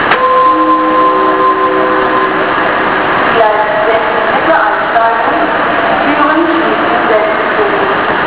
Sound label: koeln, bus